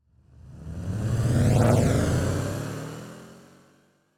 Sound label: Car passing by fast